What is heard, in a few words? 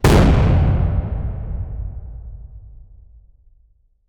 brick wall explosion; building